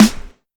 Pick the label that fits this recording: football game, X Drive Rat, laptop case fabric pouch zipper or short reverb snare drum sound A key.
short reverb snare drum sound A key